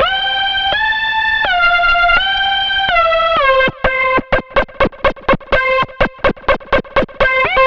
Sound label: Pillow, big beat